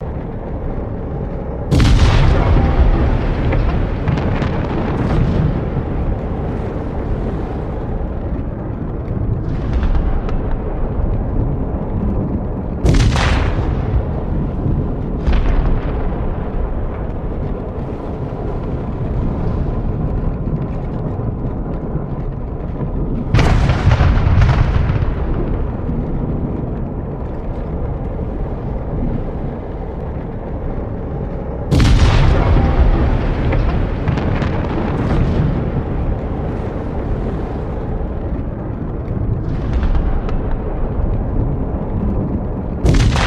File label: ambient battle distance